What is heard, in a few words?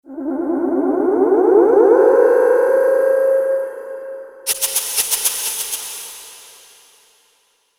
fantasy creature